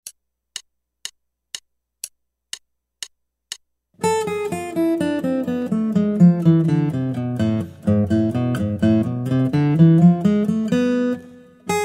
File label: Guitar